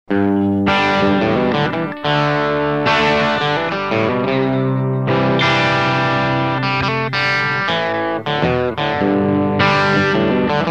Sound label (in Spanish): rompeolas, TubeScreamer